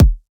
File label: Kick - E; Drum One Shots; Kicks; Cymatics; Light Free Sample Pack; Bonus Samples